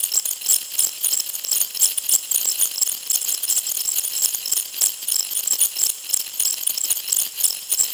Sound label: Ambient Tech Drone; Props; Warframe; Tau; Ambience